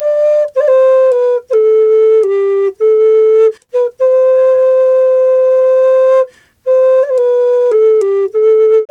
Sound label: D2 108, Didgeridoo; Big Fish Audio - Didgeridoo and Other Primitive Instruments; FLU 108 BPM